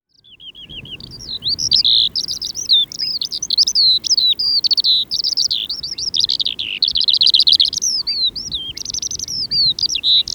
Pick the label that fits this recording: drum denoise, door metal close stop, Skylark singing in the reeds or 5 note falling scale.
Skylark singing in the reeds